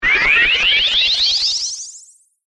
Ascend Distortion, Production, Element, Ascend, Distortion, Feedback; Ascends